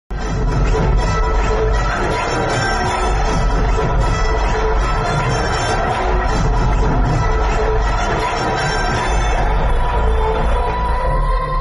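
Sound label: fight visual background music ringtone; rangabali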